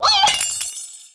Chicken Death

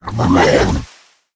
Moat Lurker Attack

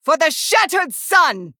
Female Blood Elf Attack

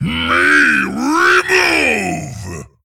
Male Abomination Attack

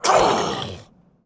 Male Skeleton Attack

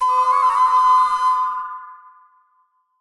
Piper Underlay Play; Witchwood

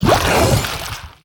Growing Ooze Attack

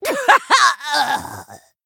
Troll Emote Death

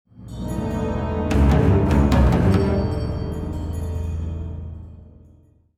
Play Stinger

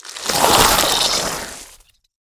Smothering Starfish Attack

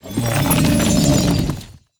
Living Light Death

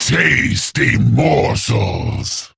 Male Crypt Lord Attack

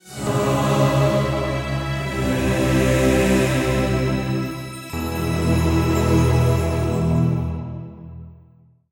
Stinger

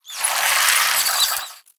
Glowfly Attack

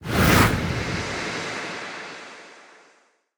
Clock Tower Soul Smoke On Poke Sound

